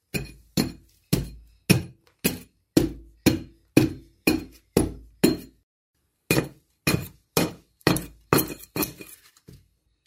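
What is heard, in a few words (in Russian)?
обтесывают колышек; топор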